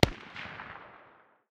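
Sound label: light machine gun far distance; tacz-tweak-pack; taczmechanics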